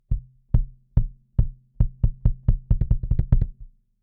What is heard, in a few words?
Limit kick Kick; SSL X